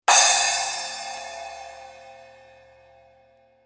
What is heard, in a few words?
sabian 10 aax splash brilliant